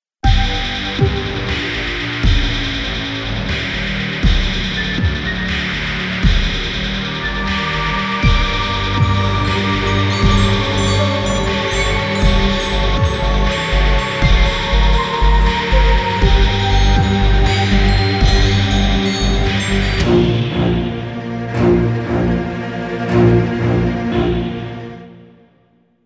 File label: Theme (With Choir); Cell; Dragon Ball Z Best of - Volume 4